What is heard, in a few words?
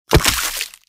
chicken death